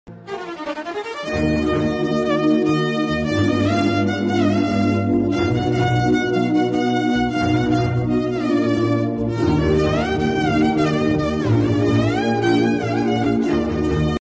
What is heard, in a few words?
kannada movie bgm; monsoon raaga; clouds